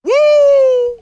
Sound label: "woo", voice; ooh ahh